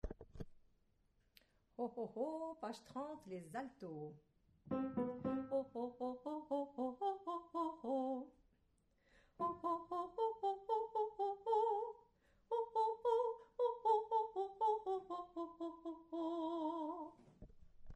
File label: Alto